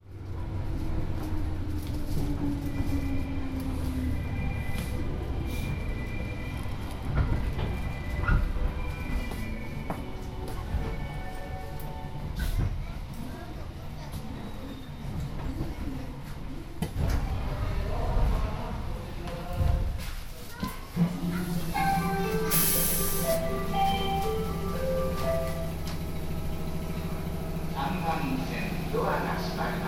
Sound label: train, tokyo